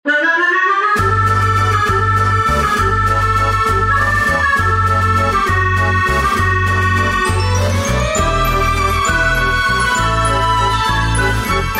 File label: valse